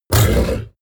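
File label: coloss growl deep short, Creatures; kreaturen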